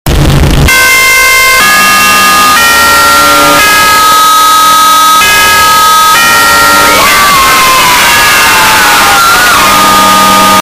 very loud; fnaf 1

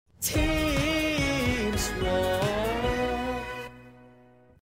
team swap jingle; tpot

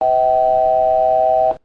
737 alarm

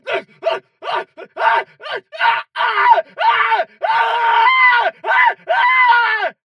aaa aaaaaa aaaaaaaaaaaaaa

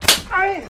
slapped aah

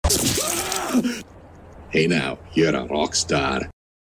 scream for pain

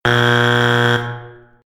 red buzzer; got talent

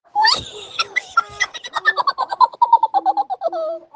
ihihihi

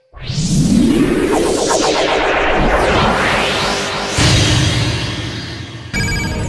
fusion summon

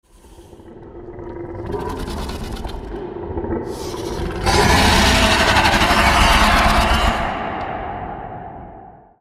alien queen roar

